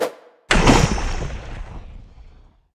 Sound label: volcano splash